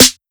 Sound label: 808 Snare; Slate; Vintage Drum Machines Volume 2 808 Edition